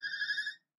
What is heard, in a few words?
parrot